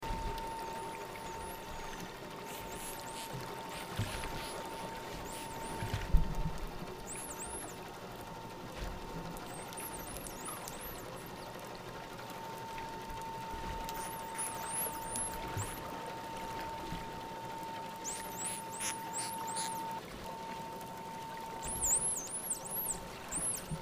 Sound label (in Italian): delfini, rumore subacqueo; Grado; acque marino costiere e lagunari